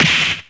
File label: SE GAME BREAK; maimai